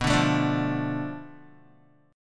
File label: B Minor 7th Flat 5th B, Guitar; strummed; 4E,4A,5D,5G,5C,6F